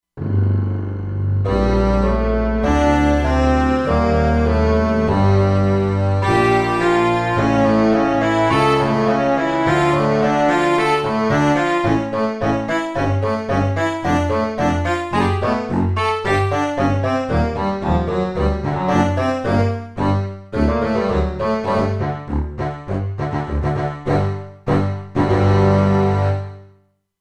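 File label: Contrabassoon-Double Bass; Factory Presets; E-mu Proteus-2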